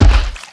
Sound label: step, footsteps; pudge